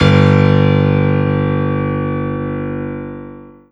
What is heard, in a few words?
piano